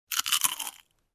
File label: Short Fruit Bite